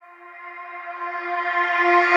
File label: vocal build F; Slate; AURORA Sample Pack; Vocals